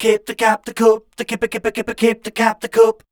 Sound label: scat